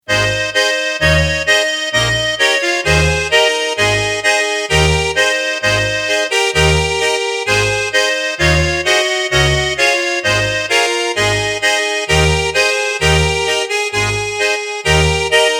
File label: Ab